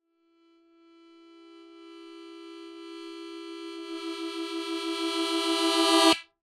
musical harmonica rising amplitude

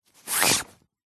foley clothing nylon thin pull rub scratch sound

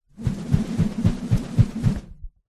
foley rope spin swing fast whoosh

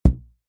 rubber band large ping twang; foley; zapsplat

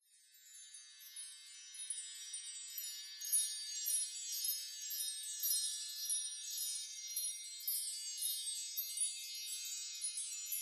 wind chimes ambience; silverplatter audio

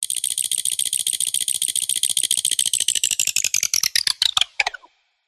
squeaky metal Designed UI; PMSFX

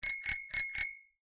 bicycle bell ring; vehicles

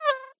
cartoon fly mosquito pass by fast

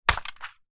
impacts plastic vhs cassette tape

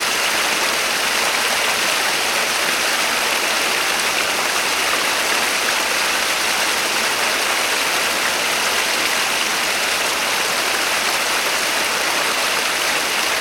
waterfall small; sound effects one

